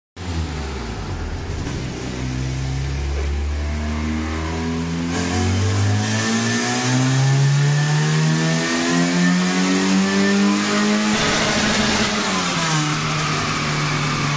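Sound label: Turbo, exhaust; سورن; Suren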